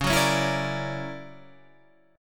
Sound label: D Augmented Major 9th C sharp, guitar